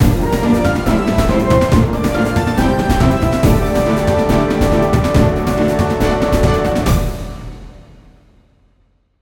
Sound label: Level End Jingle; Toziuha Night Dracula's Revenge